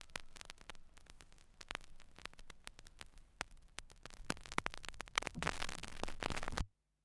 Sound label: Record Deck Needle Up